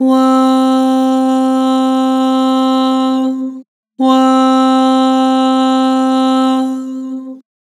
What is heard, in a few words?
"Woah", Harmor Vocals